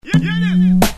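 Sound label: conga vox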